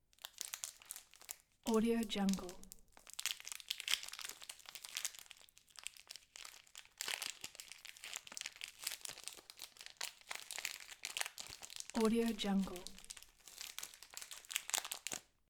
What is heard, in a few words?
open chocolate bar; spacelabstudio